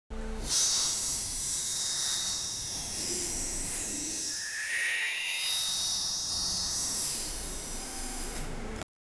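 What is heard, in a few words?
asmr head spa wire massage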